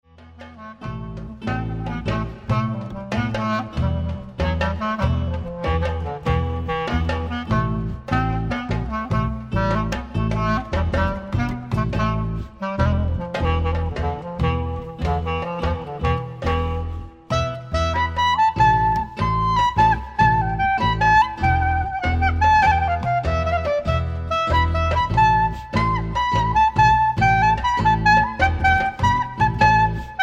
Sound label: Jewish Clarinet Klezmer